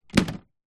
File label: industrial engine oil large tub set down; zapsplat